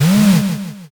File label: servo